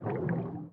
skid sea back; Blind Drive

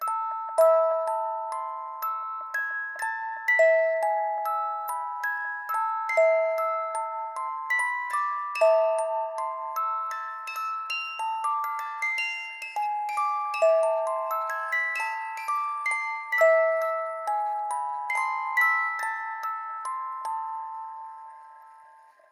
music box; NS Studios - ultra sound collection

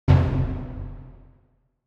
Drum Deep Symphonic - Orchestra Drum Single Hit; Hollywood Edge; sound designer's toolkit v2

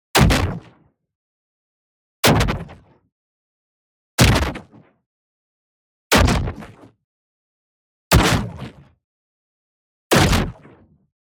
EXPLOSION SMALL Distorted Punch; boom library; Matter

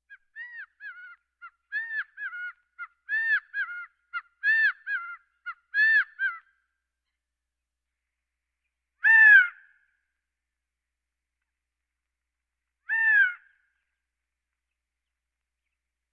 Birds

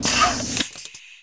Health Powerup; Pickups

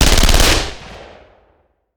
Machine Gun; Sound Ideas; Guns

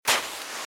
Footsteps Sand Dry Walk Left; RadwinIsland

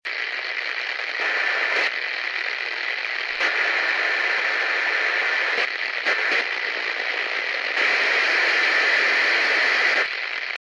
Walkie Talkie - Looping; RadwinIsland

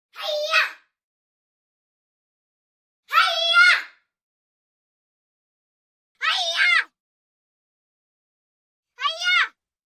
Vocals (Girl Yell); Hollywood Edge; Martial Arts and Human Impacts

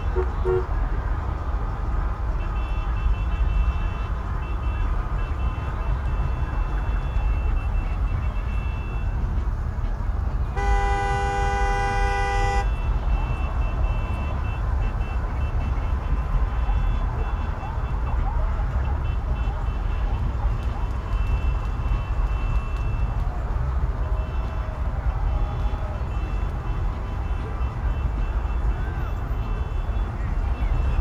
Urban traffic special event distant; urban and suburban; boom library

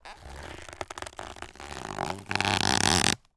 CREAK Wood Tree Creak Loud; mono; Universal Sound FX

